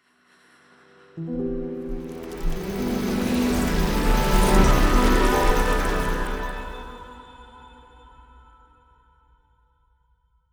sundried melodi; M8; random and out of place

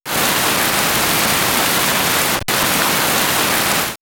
Nature Rain; X-Static Goldmine

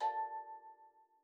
A4, STEELDRUMS; Xsample VOL-4

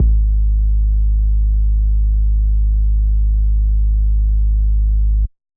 BD, Yamaha DJX 2B

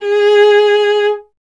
viola, solo instruments; Miroslav Vitous; Solo Instruments VOL-1

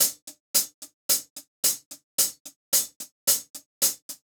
Hi-Hat Groove

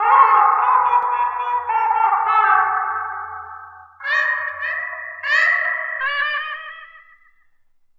Spaced Shawm

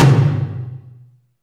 HALL LOW, TOMS; Back In Time Records; Big Bang

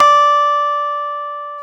KEY Hohner Clavi; Club-50 - Foundations Roland; Clavinets

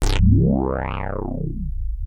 Access Virus B; Synthworx

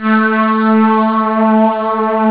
chord; battle; Amiga Soundtracker Sample Packs

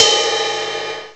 CYMBAL; CMI Sound Library Rev 1.3; CYMBALS

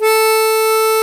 HARMONICA

